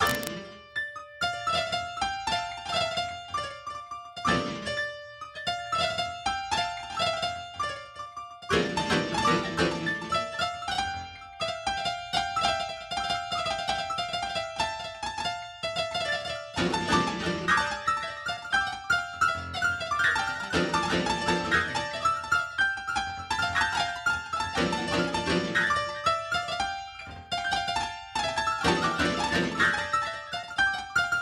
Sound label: flute